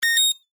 Multimedia Action, Technology, Multimedia, Action, Element; Alert Sound Effects; Alerts (Sound Effects:Multimedia); BlastWave